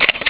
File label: Shutter; LG; CU320